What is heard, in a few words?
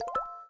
raindrop sms; Samsung SGH-i617 BlackJack II